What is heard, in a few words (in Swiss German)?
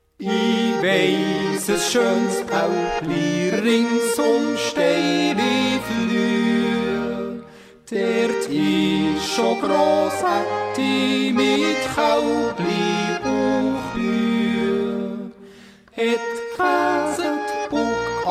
Üsers Älpli (Duett)